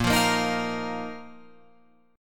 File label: Bb Suspended 4th Bb down Guitar Open F; strummed